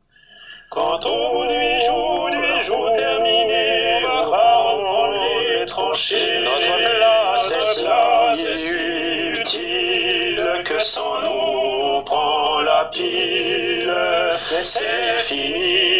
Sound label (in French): basse